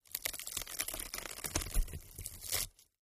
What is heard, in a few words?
knife twist chest, stabbing